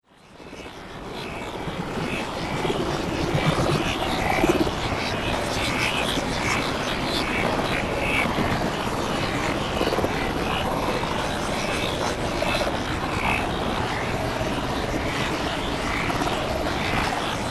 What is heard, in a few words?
Soundscape Critters Lair, scary soundscape sound effects